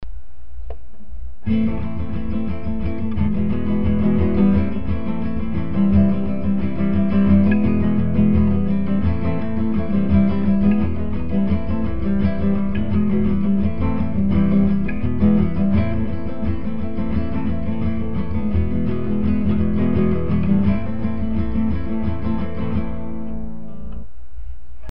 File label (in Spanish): ejercicio acordes, guitarra